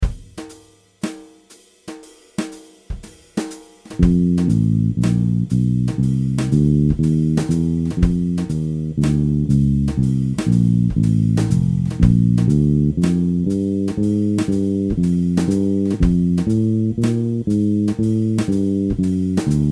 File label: f blues transcription practice count in